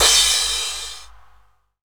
CRASH, Dance Mega Drums; Best Service; CRASHES

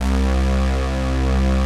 SYN BRASS C2, Prophet 5; Greytsounds VOL-1

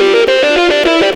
xtra lead

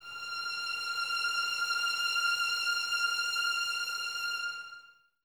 VNS F 6, Violins; Miroslav Vitous; String Ensembles

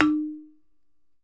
BALAFON D; E-MU Producer Series Vol. 7 – Old World Instruments

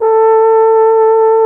BARITON; Best Service; Alpine Volksmusik

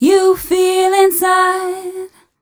f 132 mel, Vocals, fem

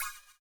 CYM, Ethno Techno; ILIO - Ethno Techno

